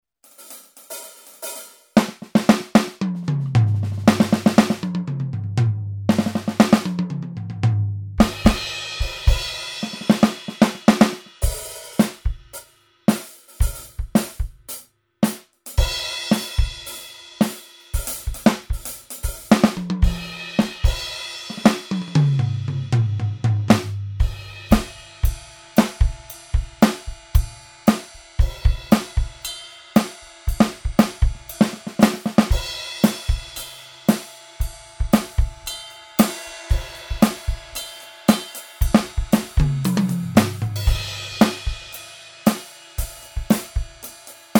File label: Kit TruAcoustic; ATV a5D